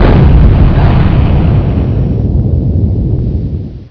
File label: quake; mod quoth; gug